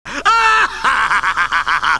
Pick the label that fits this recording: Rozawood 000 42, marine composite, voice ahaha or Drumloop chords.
voice ahaha